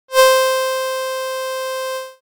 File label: Violin C, synthlead